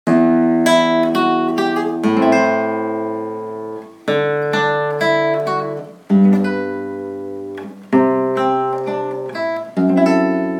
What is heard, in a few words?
Guitar solo; Guitar Club Settembre 2015